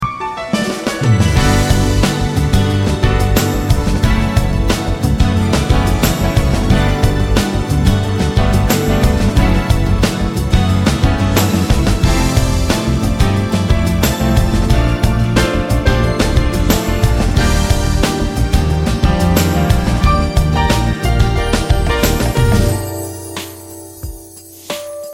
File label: without vocal